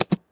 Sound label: Spacebar